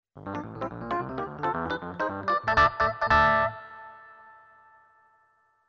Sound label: rhodes